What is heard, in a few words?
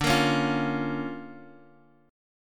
D Minor Major 7th Sharp 5th, D, down; DmM7#5 strummed